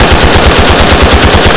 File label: Inf Weapons; Red Orchestra 41-45